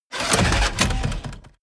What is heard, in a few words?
tank small damaged